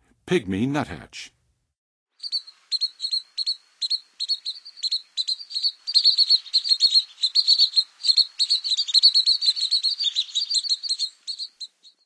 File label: Pygmy Nuthatch, Songs of Birds; North America; Western USA; Western USA Songbirds